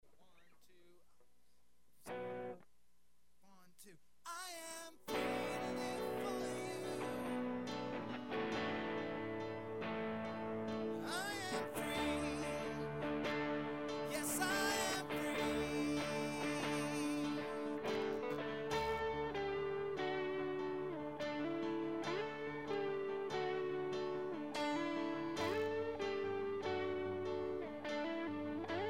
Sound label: Guitar Line